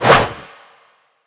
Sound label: sword bombard fly